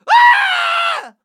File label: Raptor scream; ice age scrats nutty adventure